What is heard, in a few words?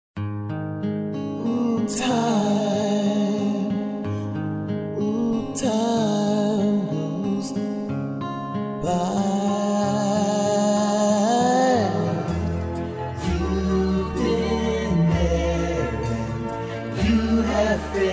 Singing